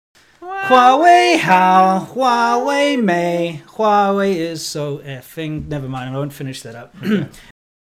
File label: Huawei Jingle